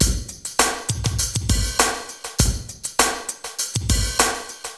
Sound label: SHORT SWIN 100, LOOPS